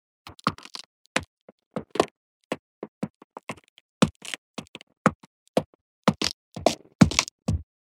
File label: wooden footsteps